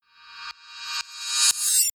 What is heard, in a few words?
rise alternate shard